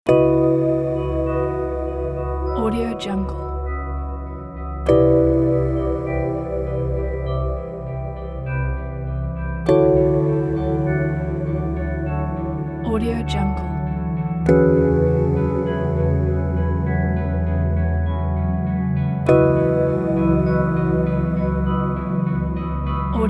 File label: deep divine soundscape meditation